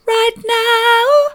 right now soft, vocal

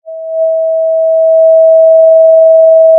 pop, Sound of a cruise ship horn

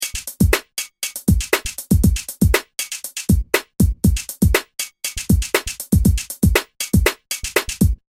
beat

